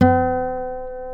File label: C#4 nylon, Guitars